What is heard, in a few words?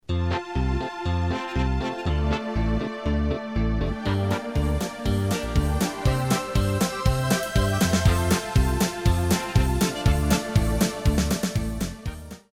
Polka